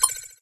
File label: click; darkfi